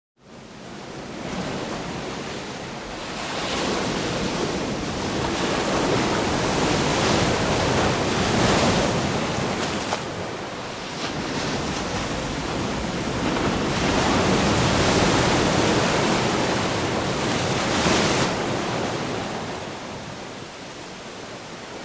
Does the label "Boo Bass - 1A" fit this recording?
No